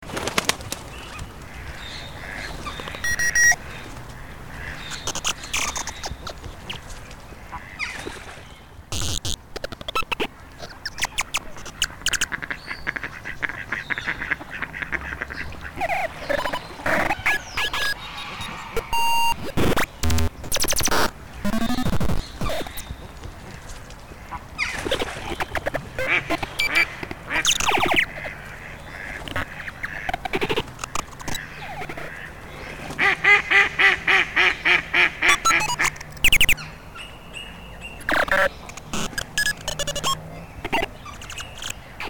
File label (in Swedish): bruspipare; Swedish birds; Sädesspov och andra fåglar